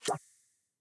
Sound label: reelbounce; vegas night life